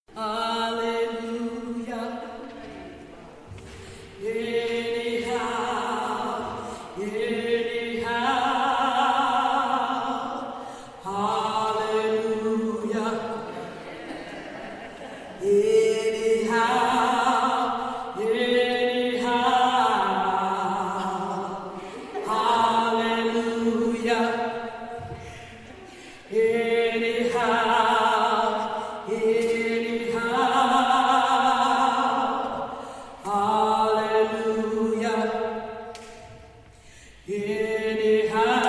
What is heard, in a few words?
TENOR